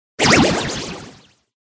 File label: mage female buff